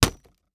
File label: Impact Board Wood Hit By Copper Pole; Impacts